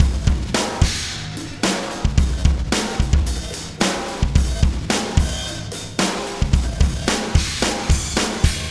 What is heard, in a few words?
Beat, Processed Drumkits